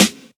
treble heavy acoustic snare sound g key, snare drum